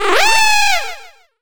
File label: Cry